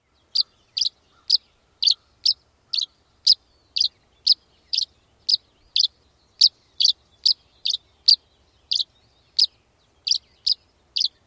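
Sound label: dawn song, Tree Swallow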